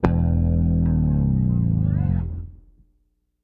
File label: FALL E0, BASS, EFX FALLS